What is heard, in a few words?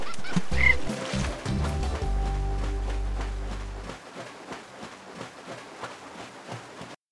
monkey scream fall water splash game lose